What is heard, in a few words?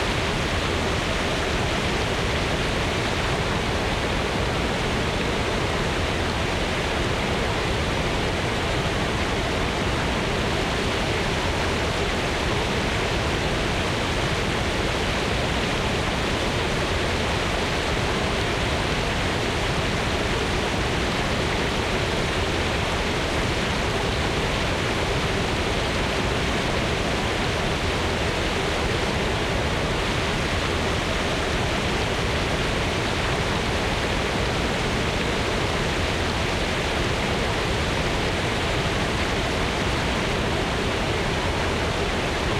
Ambience Town Underbridge